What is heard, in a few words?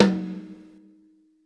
Tom, Live Kit; Sonic Foundry (Sony Creative Software); Ugly Remnants VOL-2; Single Shots; Beatstuff